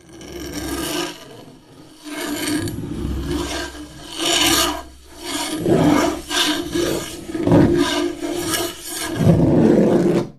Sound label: scrape horror knife